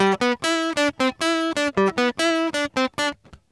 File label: merengue, World Grooves; Best Service ProSamples vol.14; AKAI